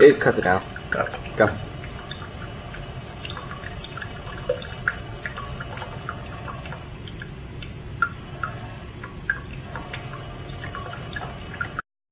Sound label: static h2O